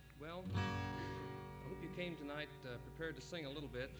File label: Applause